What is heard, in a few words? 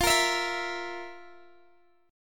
F Major 7th Suspended 4th, Guitar, Open D